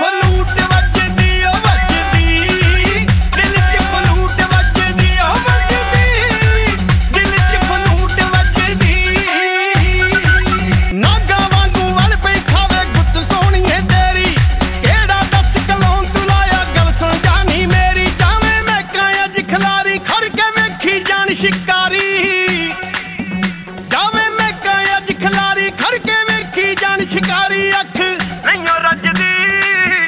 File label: Flute; Punjabi